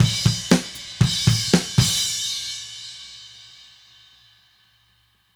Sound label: Drums Ending, 70s Analog Funk; Sonic Foundry (Sony Creative Software); What it Is! 70s Analog Funk